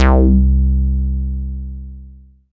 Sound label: BASS MONO